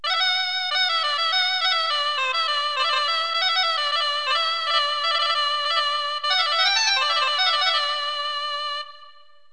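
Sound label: shanai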